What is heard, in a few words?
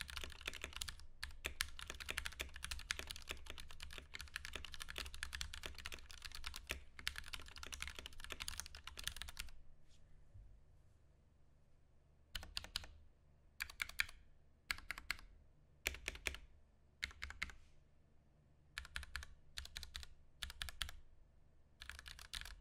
Ducky One 2 Mini Sound Test